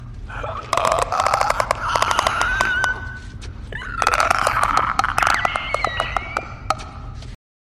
clicker sound; the last of us; memes

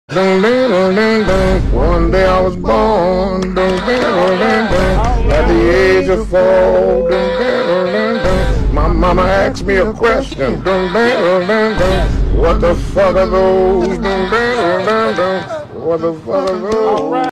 RIFF

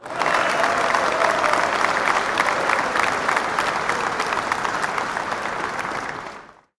clapping crowd

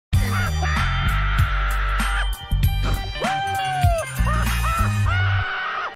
mission complete; gta san; Memes

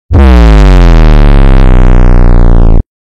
BASS DROP SOUND; memes

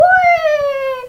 ooouueeehh

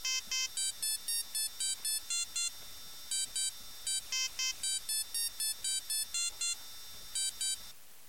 ringtone; fox; gta iv

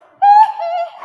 HEHE; memes

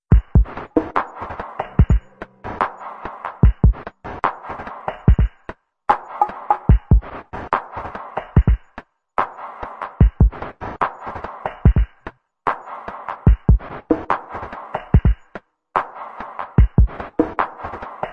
loading screen; gta iv

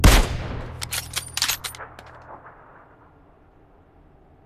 kar98k; Memes

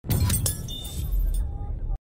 headshot sound effect; battlefield 1; Memes

